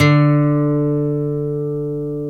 GTR 6STR D, Steel String; Roland LCDP02 Guitar and Bass

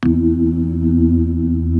HAMMOND E2; Jazzistic

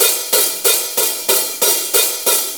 Shuffle HiHats; Sonic Foundry (Sony Creative Software); Drum Tools; Shuffles; HiHat Loops

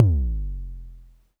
polyvox bassdrum; Formanta Radio Plant

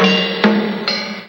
Ancient Music FX, Industrial

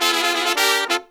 132, Pop & Funk Brass

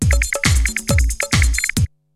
BREAKBEATS, TECHNO

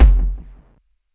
KICK, DRUM N BASS KIT; DRUM N BASS:JUNGLE BEATS; DRUM N BASS KIT 2

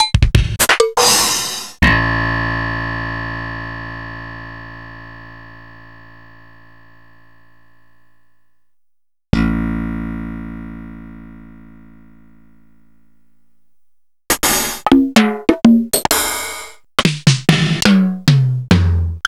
Korg DDD5

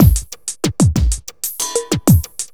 Beat, Drums & Percussion; Electrocution; Beats

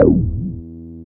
DR. LOOP, BASS; X-Static Goldmine 3

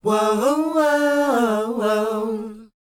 WHOA A, Vocal, R&B & Dance; ILIO; Vocal Planet

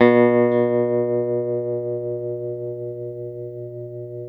TELE TREM C, Guitars, TREMO; Spectrasonics; Hans Zimmer Guitars VOL-1; TREMO-LUXURY

